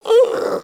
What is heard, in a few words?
Puppy Interact Affection Closed Mouth; Vocals; Warframe; Kubrow